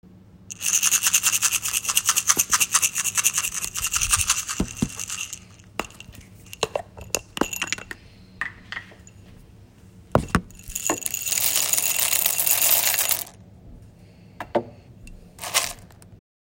coffee bean

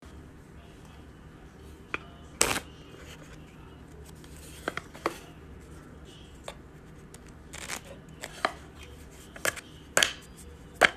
Filters and case

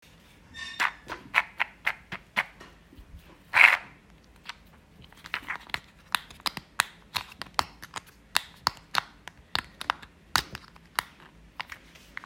Dental floss

